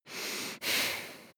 breath female nose in out

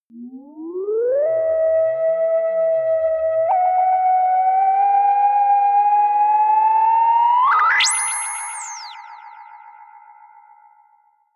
alien panpipe

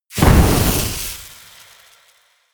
burst

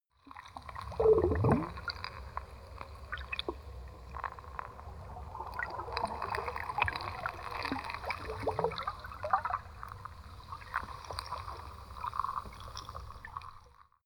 sea

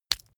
leather impact mud medium

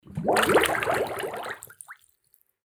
water bubbling

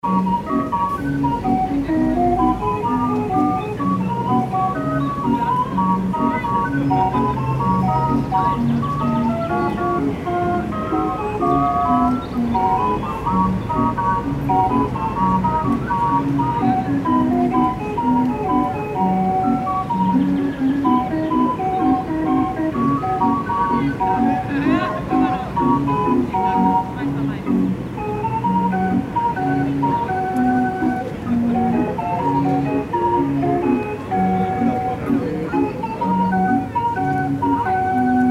hurdy-gurdy birds park atmo

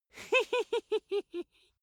dwarf laugh sneaky soft

